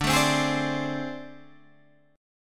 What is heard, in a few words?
A Minor Major 7th Double Flat 5th D, chord